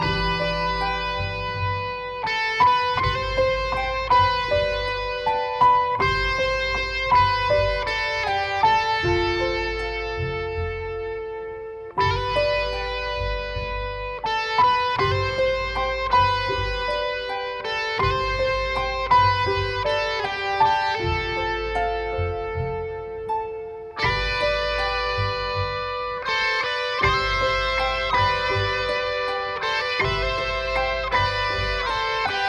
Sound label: BGM Healing; Blade Strangers